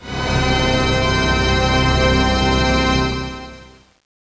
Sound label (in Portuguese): ORGAO; INSTRUM